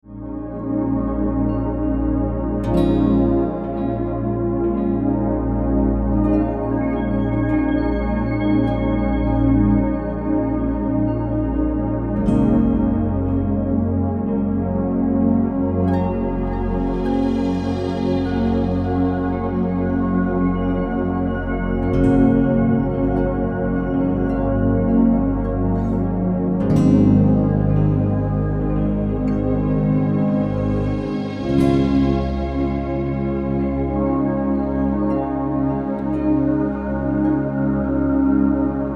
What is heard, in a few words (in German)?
Entspannungsmusik